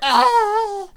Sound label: Snake Archer Attack Vocals